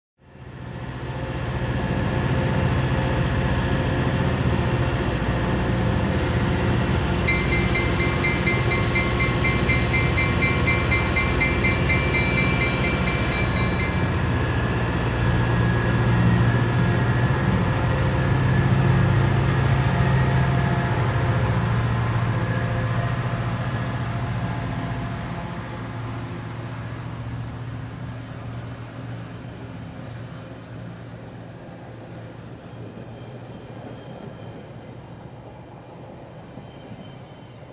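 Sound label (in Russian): отправление локомотива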